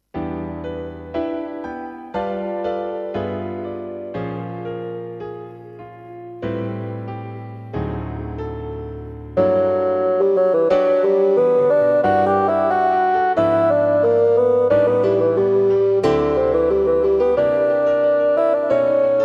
Bassoon and Piano; running lines and interesting harmonies

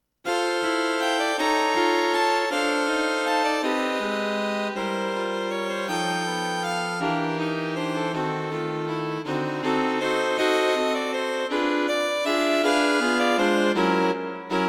String Quartet; String Quartet for Concert performance; A jazz piece in modern style.; Unusual harmonies and rhythms to keep you on your toes

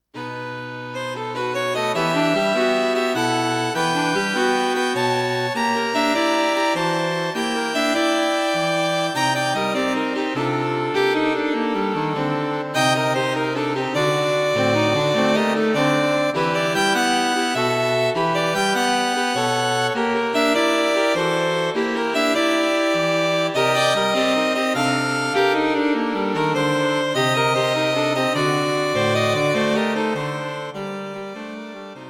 String Quartet for Concert performance; A modern and sophisticated piece reflecting life in a vibrant city.; Lively but not too difficult.

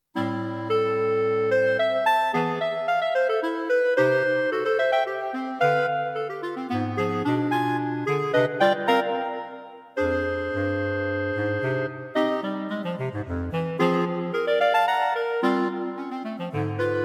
Scherzo, Clarinet, A vivacious piece; Clarinet Quartet for Concert performance; Full of humor.; Clarinet Quartet - 3 Clarinets + Bass Clarinet; Quite difficult (grade 6 or 7)

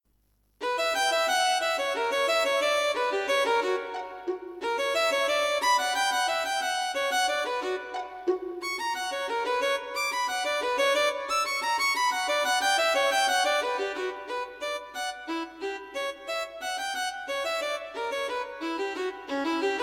Four Violin Fantasies; Unaccompanied Violin Solos; The audio clip is No.1.

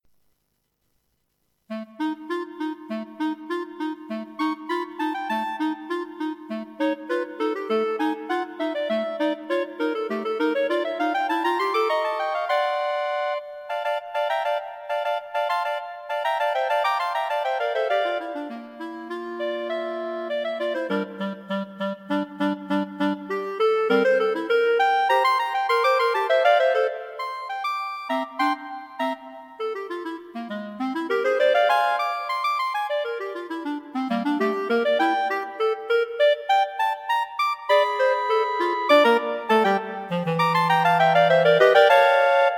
Clarinet Trio; Clarinet Trio - Three Bb Clarinets; 1 - Moderato 4/4; 2 - Allegro 3/4; 3 - Andante - 4/4

